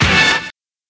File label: Hip Hop Hitter